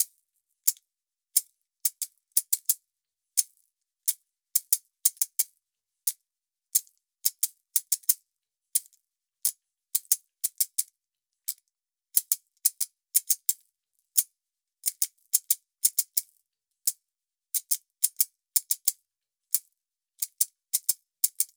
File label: shaker 089, Hip Hop India 089bpm; Hip Hop India Modern Percussion